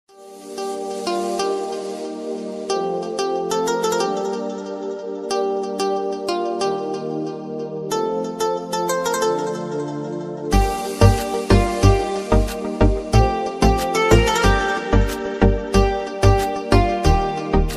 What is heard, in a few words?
instrumental ringtone oriental beats ringtone beats